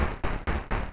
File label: knock